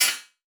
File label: Multimedia Internet CD-Rom Flash Click Mechanical